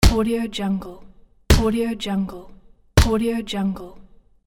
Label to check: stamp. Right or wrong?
Right